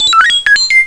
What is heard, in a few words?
calculator, electronic sounds; Sound Effects for Multimedia - Volume 1